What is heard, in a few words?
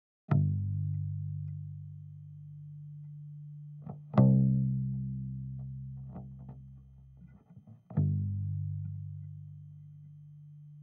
60's Combo